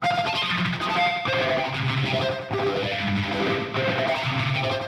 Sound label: Guitar Lick